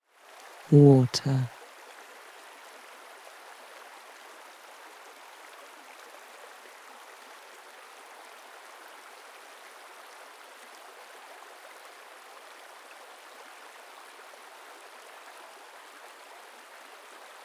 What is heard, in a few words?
MEDITATIONS WATER